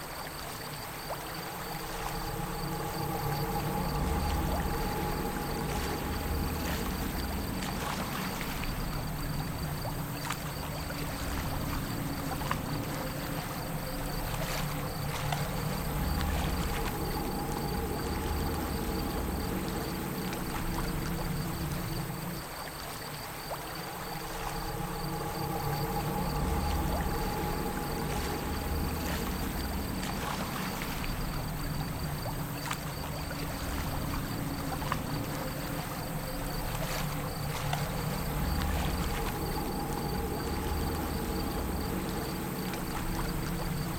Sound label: Creepy Underwater; hidden in time mirror mirror